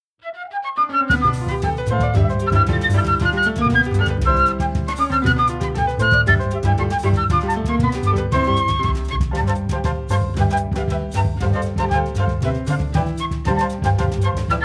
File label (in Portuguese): choro